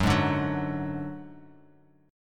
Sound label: Gb Suspended 2nd Sharp 5th Bass Standard; Gbsus2#5 strummed; 14,11,12,11